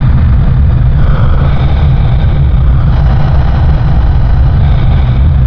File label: room tone; PC Gamer IT CD 41; Daikatana